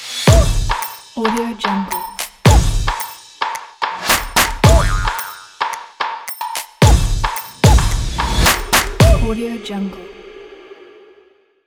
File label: percussion logo; light-sound